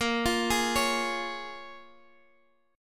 C sharp Major, A sharp, chord; C#/A#; arpeggiated; JGuitar

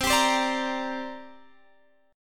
C 7th Suspended 2nd, Guitar, Standard; C7sus2 strummed; JGuitar

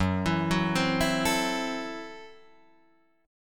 F sharp Minor 7th Flat 5th F sharp Guitar Drop D

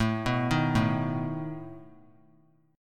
A Suspended 2nd Flat 5th A down; Asus2b5 arpeggiated

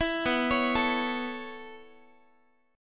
B 9th Suspended 4th B down Guitar; B9sus4 arpeggiated; 4A,4E,5A,5D,5G#,6C#; chord shape; 19,19,16,19,x,x; JGuitar

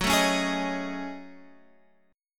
F sharp Minor 6th Add 9th, Guitar

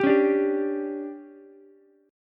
D Major 7th Suspended 4th, Ukulele; DM7sus4; strummed; JGuitar

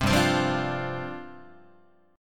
G 7th Suspended 4th G down Guitar

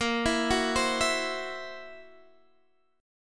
Fsharp 7th Flat 5th, guitar chord

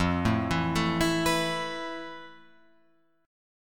F Major 7th Flat 5th Guitar Open F, chord; arpeggiated; FM7b5; 5,4,4,4,7,6; JGuitar

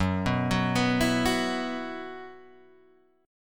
E Suspended 2nd F sharp

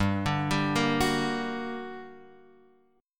G Minor Major 7th, down

